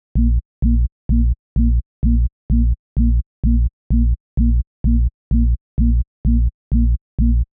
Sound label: 128BPM Bass Root A#, Tech House; Titano; Vengeance Samples; Bonus TechHouse Kits